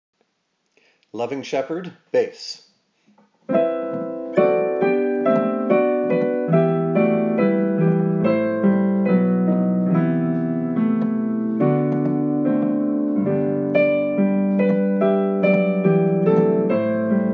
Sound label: Loving Shepherd Bass